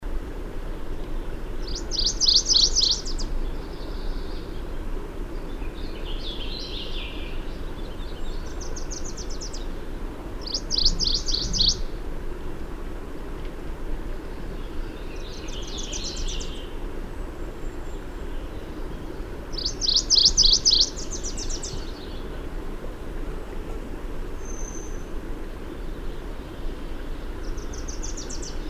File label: MacGillivrays Warbler